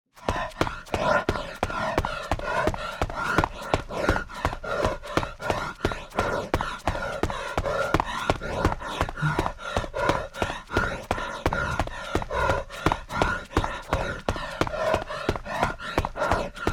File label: Breathing & Running